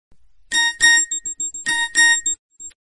beep beep cute message notification tone ringtone, sms ringtones; samsung sms ringtones